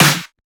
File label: SnareClap; FLPRO